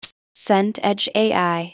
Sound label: shaker short